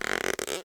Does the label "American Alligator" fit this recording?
No